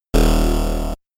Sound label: phaser stun